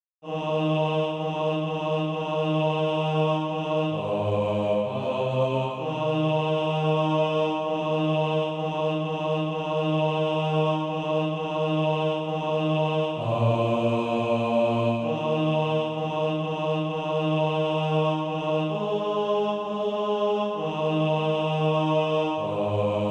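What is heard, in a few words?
bass, Practise Music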